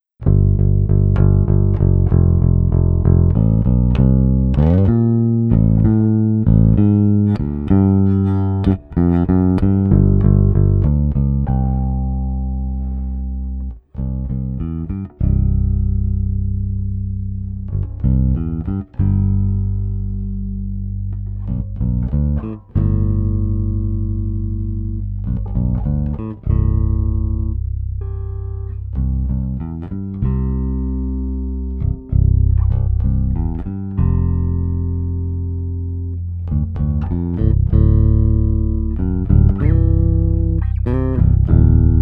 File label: blue danube blues bass tracks; mike lull